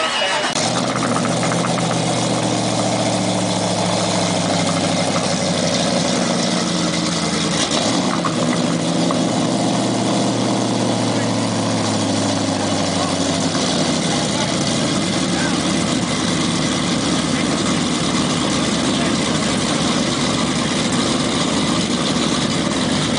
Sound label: Cigarette startup